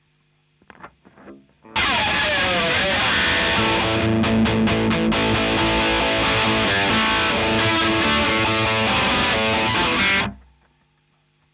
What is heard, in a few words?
Marshal Gtr